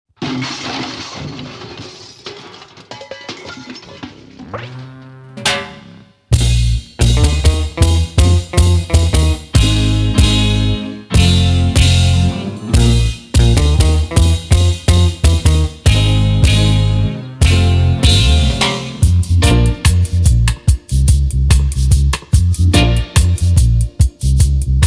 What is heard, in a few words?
Yard Music, reggae dub